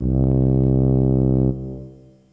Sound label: FOGHORN